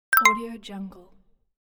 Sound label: notification